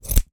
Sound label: scissors cutting fluff or hair; foley; zapsplat